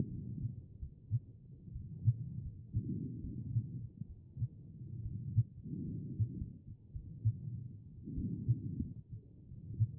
HEART; B MODE

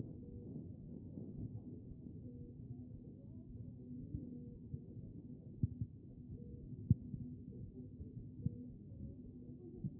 A CHEST; B MODE

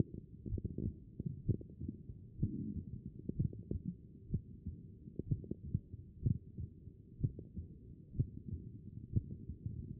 HEART; B MODE

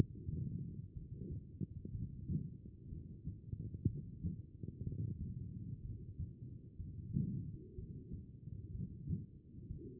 HEART; B MODE

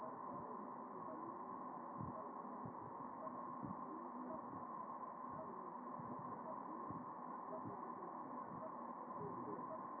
chest

